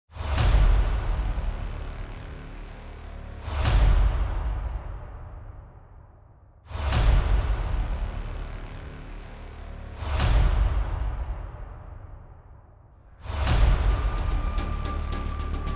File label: Intense Drums Hollywood Trailer